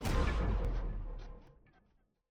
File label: wave Spawn; Mindustry; ui